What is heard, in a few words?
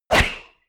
whoosh air